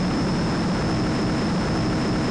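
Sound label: weapon infrared missile fly; PC Gamer IT CD 47; USAF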